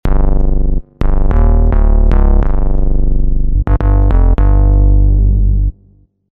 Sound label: Kick Bass, Roland V-Synth; Factory Patches